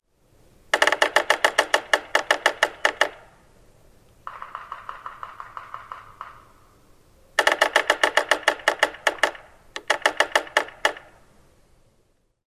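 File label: drums, Yellow-bellied Sapsucker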